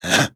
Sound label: Male Masculine Huh Hah